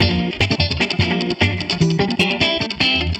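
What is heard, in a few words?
Guitar Funkjaz, Drum N Bass; Sonic Foundry (Sony Creative Software); Knutrix Drum & Bass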